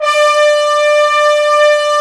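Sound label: BRS Trombones, Bone Sec.FX; Roland LCDP06 Brass Sections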